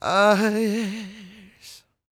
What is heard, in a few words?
CROON, Soul; Spectrasonics; Vocal Planet; Blues; CW SOUL